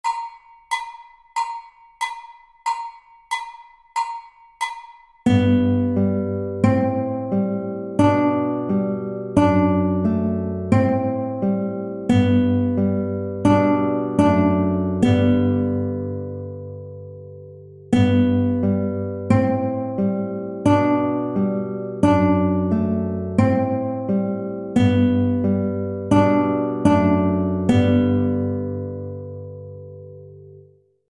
Etude 2 guitars; B string

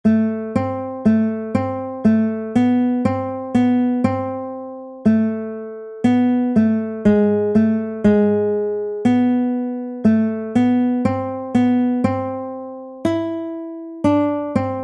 prelude 3 duet for guitar

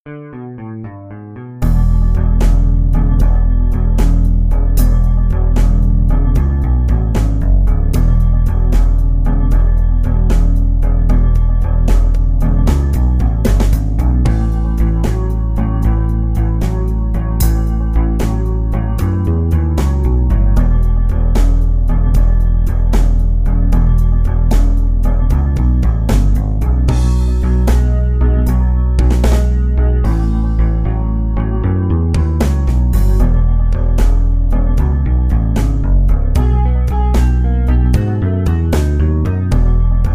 12 Bar Blues slow in E; Chord Progressions

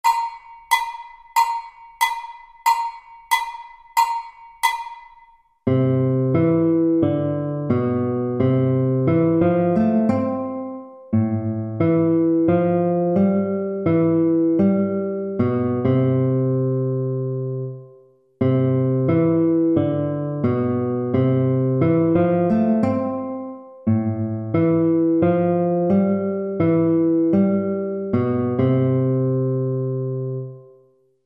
Shadow accompaniment, B string

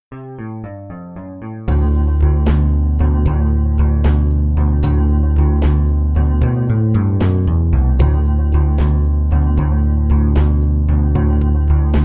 12 Bar Blues slow in D; Chord Progressions

